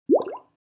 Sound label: Water bubble pop